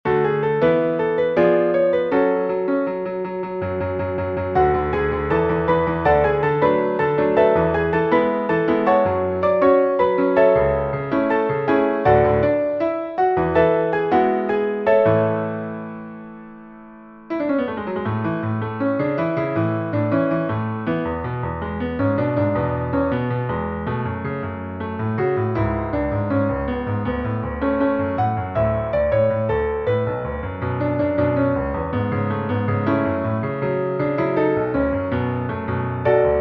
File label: piano